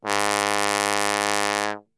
G#1 BASS TRB, Brass; Best Service; Brass Super Section

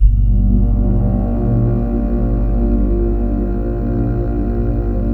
A#3 BASS; Northstar; The Wizard VOL-1

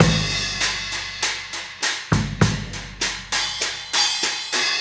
Cymbal and Kick

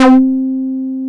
TB 303

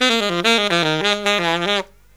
SAX, Killer Brass Riffs

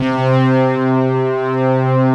LEAD, Synthsonic; Pro-Rec - Synthsonic; BASSES

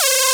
Synths Root C; Vengeance - Essential Clubsounds Vol.4; Synth Shots and Basses; Note C

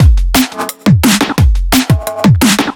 174, Drum Loops; M8; Electronisounds

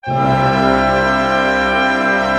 ORC PENT, Chord Cluster, Pentatonic; Roland L-CD702

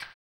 HAMBONE; ILIO; Vocal Planet; Blues & Soul

